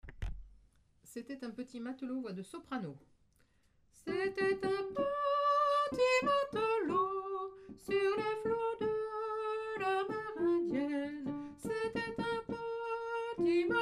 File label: soprano; un petit matelot